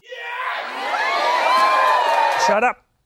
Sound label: cheering shut up